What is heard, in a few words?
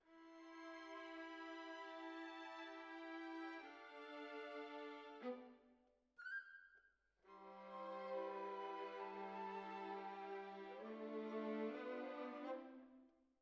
Violas Over